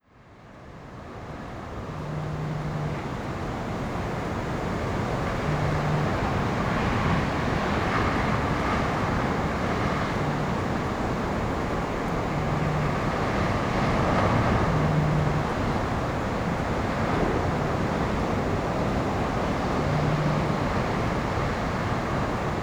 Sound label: Ucluelet Lighthouse Crashing Waves